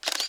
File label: camera shutter